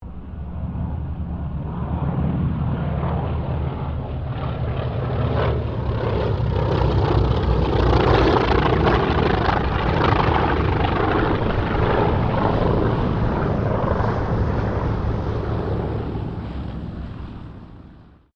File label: AIRCRAFT TROJAN SQUADRON 4 PASS